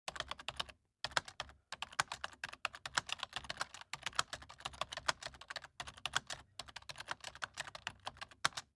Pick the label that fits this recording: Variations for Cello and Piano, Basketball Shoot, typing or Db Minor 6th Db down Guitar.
typing